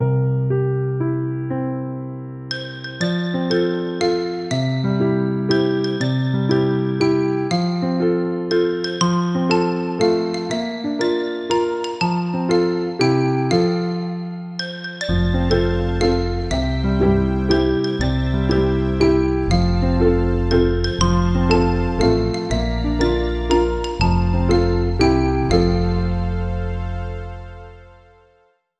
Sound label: Xylophone Happy Birthday